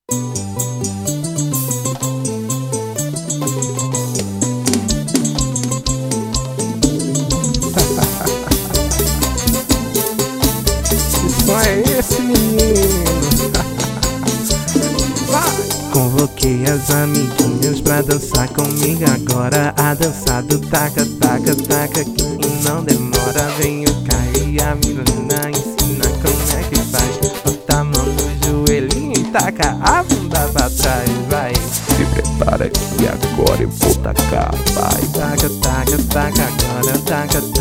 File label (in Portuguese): dança swingueira; hit do verao carnaval 2019